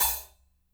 hat, micro kit; Spectrasonics; Burning Grooves CD2

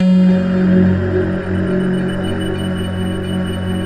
mystery pad; Optical Media International; Sonic Images Library

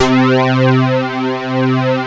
POLY SYNT C3, Moog; USB Soundscan vol.49 - Analog Fantasies; AKAI

